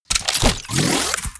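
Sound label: enemy attack jump bullet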